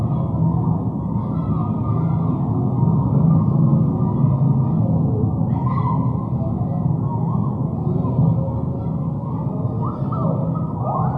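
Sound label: shacks background; ambient; Counter-Strike Global Offensive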